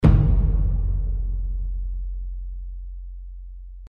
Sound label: drumhit